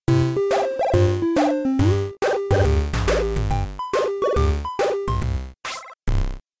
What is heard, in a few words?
jingle 8bit; lebercast